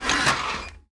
monitor move vert; p00gie

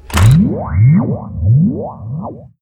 slider on; p00gie

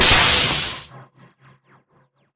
flight turn; p00gie; tardis

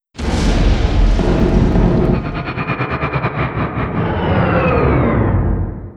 damage death, tardis; jeredek

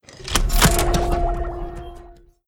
toggles on; p00gie

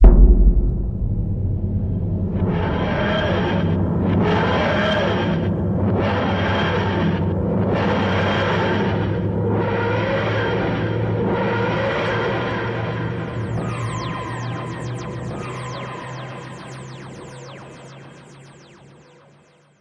demat ext, tardis; p00gie

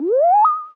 crank beep; p00gie

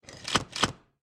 toggles; p00gie

